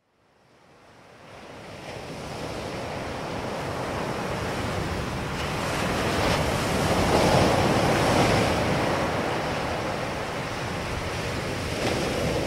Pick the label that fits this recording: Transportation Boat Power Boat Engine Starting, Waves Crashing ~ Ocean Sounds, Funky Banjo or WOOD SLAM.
Waves Crashing ~ Ocean Sounds